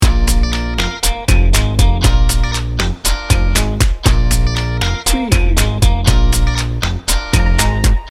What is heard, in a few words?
loop